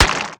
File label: mining; cuttlepop; Factorio